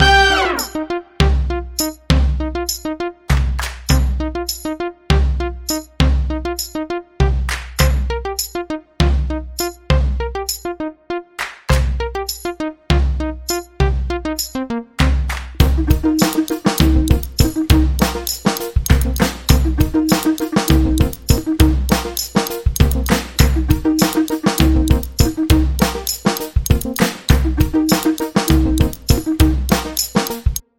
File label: funky, no voice